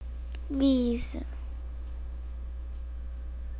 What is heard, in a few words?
wheeze